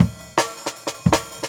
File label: Ring; Original Jungle Breaks